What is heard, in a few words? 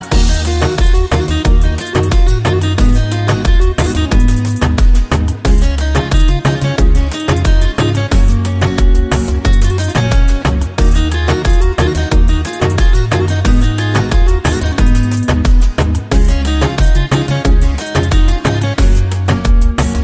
Guitar classic; رنة جيتار عالمية